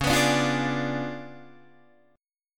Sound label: Db 7th Flat 9th, Guitar, Open D